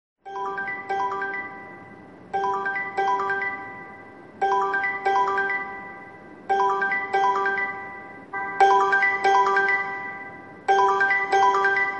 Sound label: Tripping Bead Sound